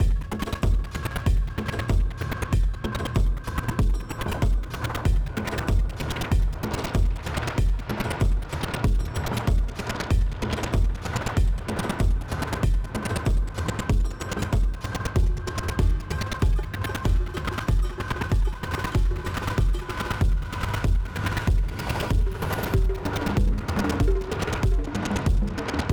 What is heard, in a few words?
Music Boss Boss Fight Phase 3 Boss Fight Intensity Medium; Sifu; Boss Hideout5; BossFight Phase=Phase3